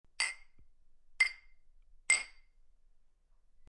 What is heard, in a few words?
Glass Clink; Bar; el colmillo de cobre